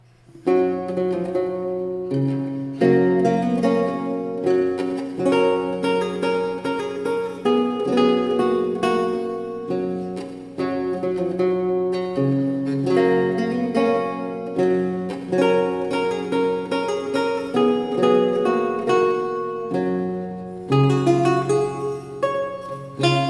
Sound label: polonoise